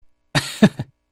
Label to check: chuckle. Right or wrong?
Right